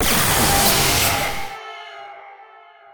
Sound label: pain; Quake 4 Alpha; strogg; valkaryne